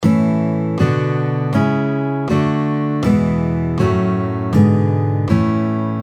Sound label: A Major Chord Scale, guitar